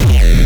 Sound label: Gabbah, kicks